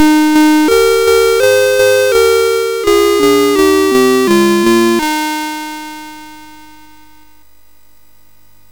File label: sid MOS6581R3, GAMES; Alice In Wonderland